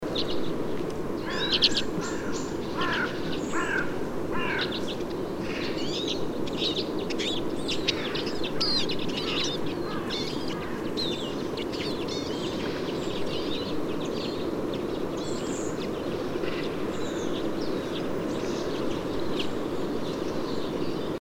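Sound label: common redpoll flight call, Eurasian siskin flight call; prerow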